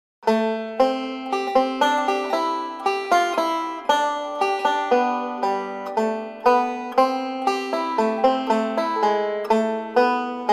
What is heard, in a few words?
Banjo Solo; christmas; What Child is This?